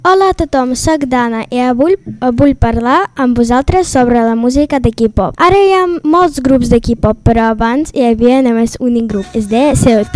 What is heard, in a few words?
Music K pop; radio a 6e de primaria